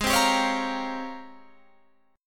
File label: G sharp Major 13th; G#M13 Chord